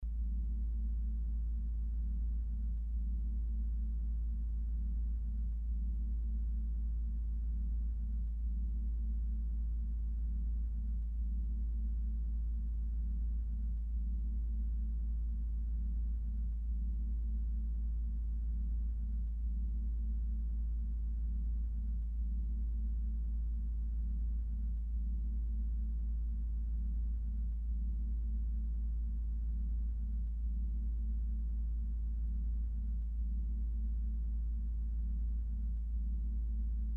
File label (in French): grave continu si bemol